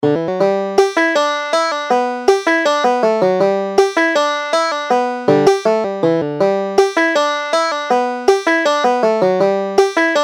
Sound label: wild horse, banjo